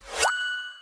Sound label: boot connect; plutosphere